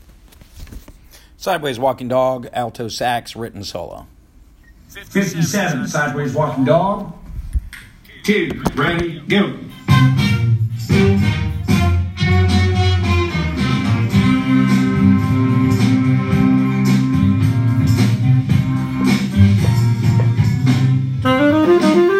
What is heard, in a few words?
alto sax solo